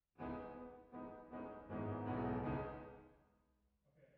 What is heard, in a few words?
Piano